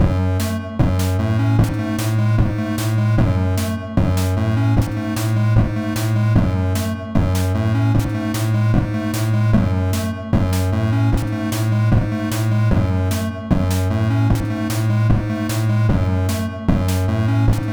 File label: Cave Loop, 8 - bit Cave Loop